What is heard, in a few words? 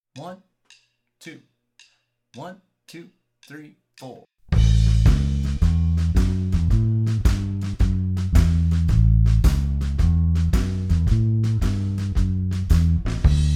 12 Bar Blues Shuffle In A, blues guitar; Use this to practice your rhythm for the shuffle feel